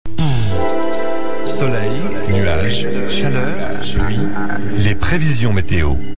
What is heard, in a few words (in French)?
Jingle Previsions Meteos; Autoroute Info